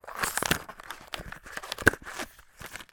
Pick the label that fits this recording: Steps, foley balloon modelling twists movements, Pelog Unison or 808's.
foley balloon modelling twists movements